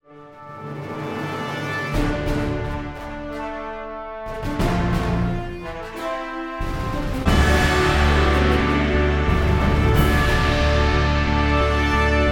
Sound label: stock music